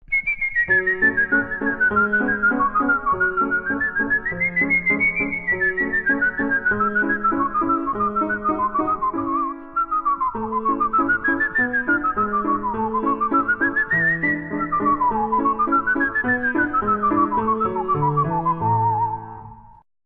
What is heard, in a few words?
turkish rondo, whistler